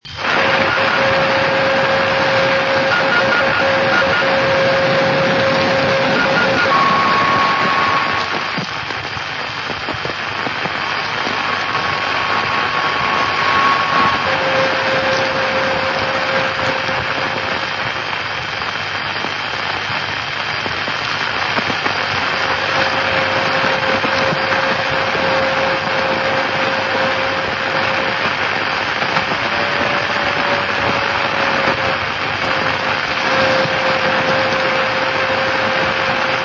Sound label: 144 mhz beacon; ao-5